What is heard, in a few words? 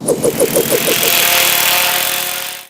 fly away; Armie